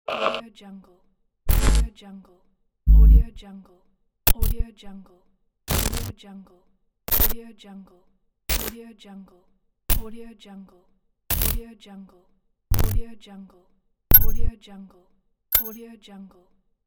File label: transitions digital